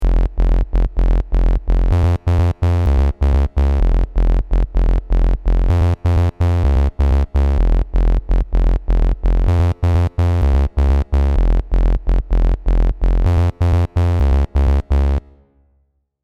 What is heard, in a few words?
bass old Osc